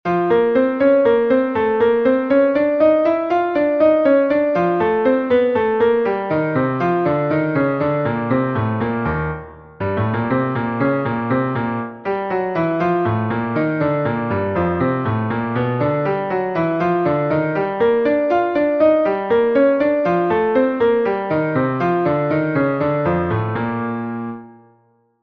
Jazz Exercise

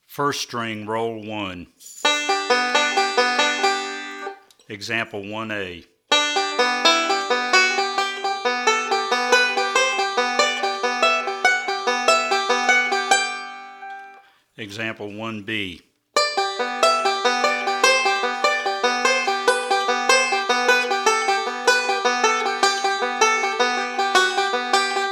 Rolls For Melody On 1st String

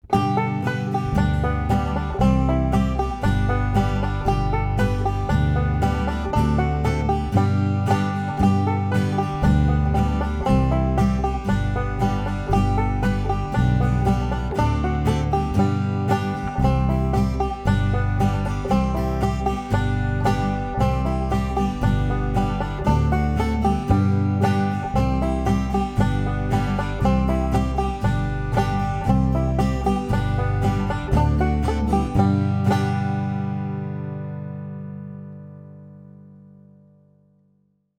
Banjo, 5 String Banjo Natural Style

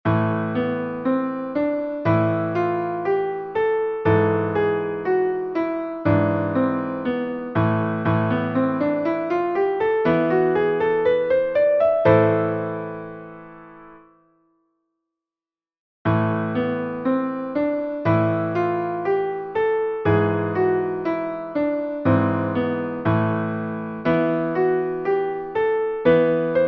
Minor Scale Progessions Relative Minor; Minor Scale Progessions: 1.1 "Relative" Minor; Scales

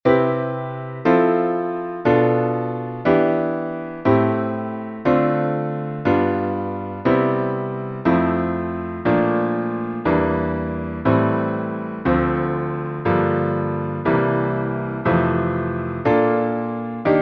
Chord Voicings Seventh Chords, Piano

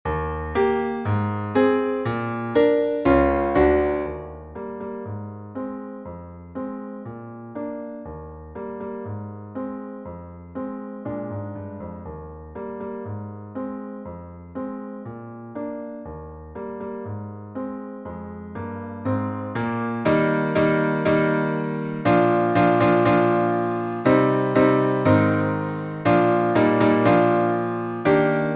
Sawmill Creek Piano

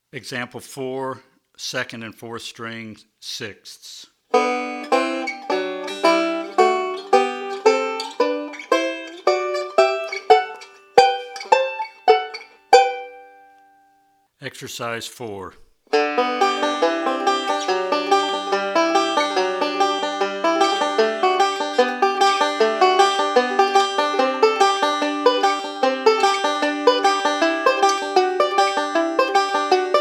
Sixths On The 2nd And 4th Strings

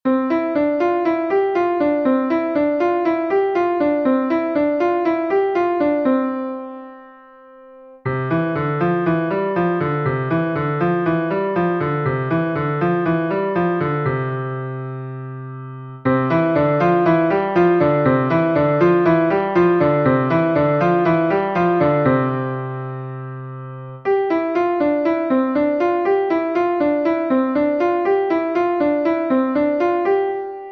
Agile Hand Walking Thirds, Piano

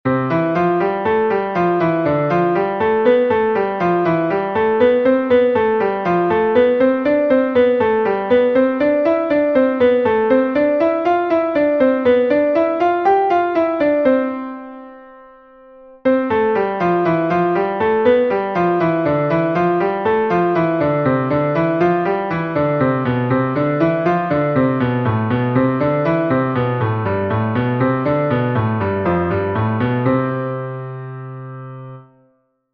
Hand Warm-Up; Hanon

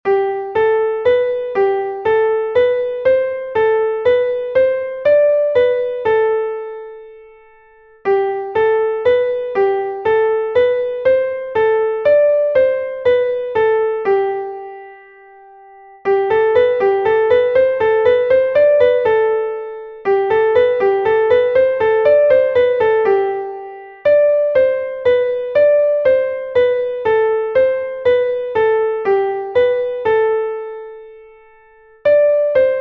3-Note Scale Fragments - G, Piano; 3-Note Scale Fragments: 1 - G; Sound Prisms Piano; Stronghand; The Strong Hand

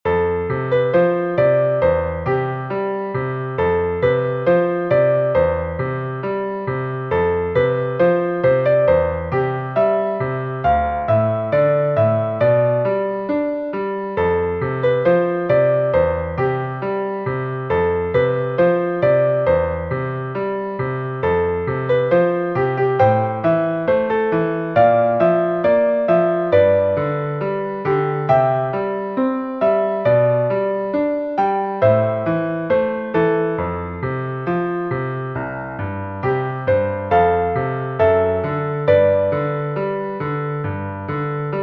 Scales - Major Progessions; Scales - Level 2: Major Progessions 1.6

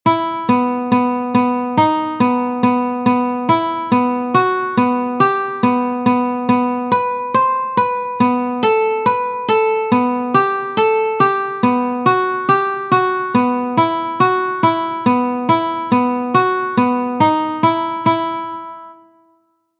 Classical Etude

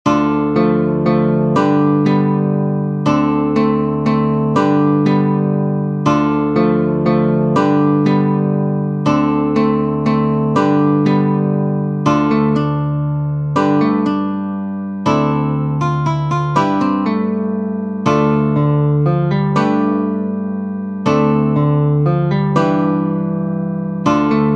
Guitar

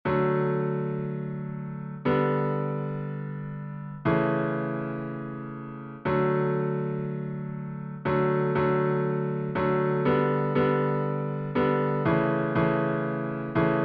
Chord Structures And Rhythms - D; Chords Packet 3

